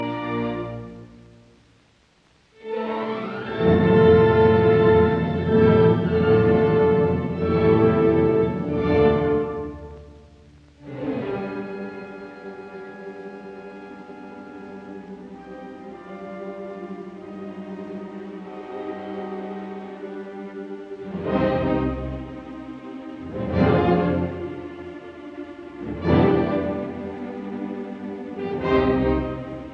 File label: Symphony in B flat major Andante un poco maestoso Allegro; Schumann; Op 38; Spring